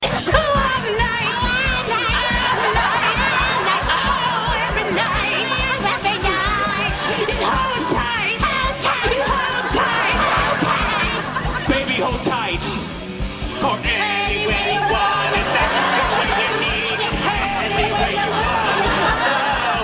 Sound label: rock band; Sheldon